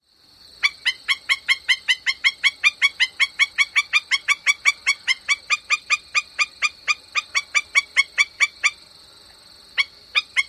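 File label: Black-necked Stilt calls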